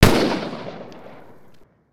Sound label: Musket, weapons; aronwk collection; ndaudio